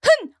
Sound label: Cloth Combat Jump; Hk